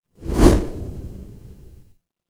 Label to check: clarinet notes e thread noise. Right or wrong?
Wrong